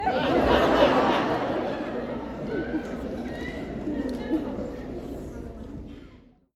Crowd laugh Samsung Galaxy Smartphone Crowd Laugh Track Sitcom